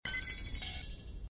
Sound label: Menu SFX; SAO